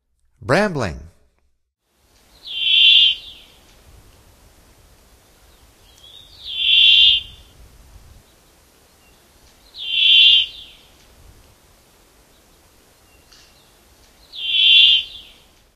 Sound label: Brambling, Songs of Birds of North America; Stokes